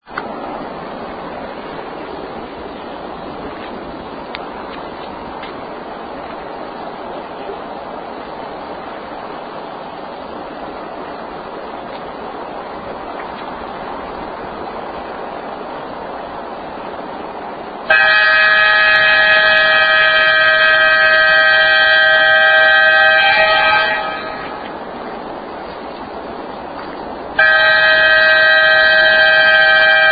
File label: All Clear